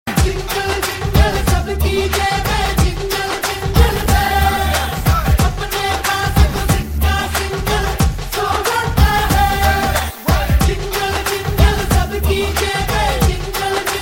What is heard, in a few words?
Jingle Jingle Tone